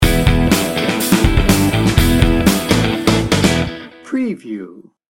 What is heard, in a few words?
bouncy funky rock sting bumper